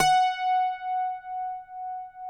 cuatro F#; Spectrasonics; Hans Zimmer Guitars VOL-2

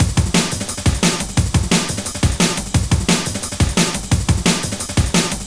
D&B Drum Loop; neuro

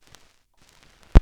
vinyl; Masterbits; Vinyl Attack

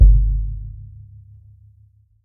WORLD DRUMS MIXED DRUMS

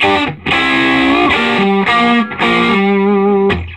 SOLO G, Vintage Blues Guitar; AKAI; USB Soundscan vol.22

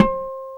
E-GT HI, INST WAVES

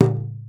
LOG, INDIAN PERC

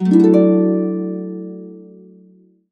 HCDMAJ, Complete Harps; USB Soundscan vol.62; AKAI

